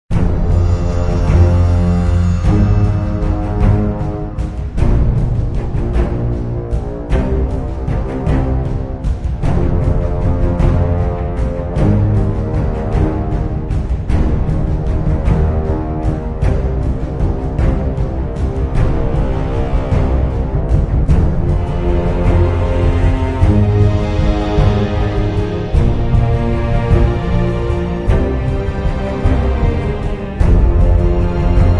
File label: battle music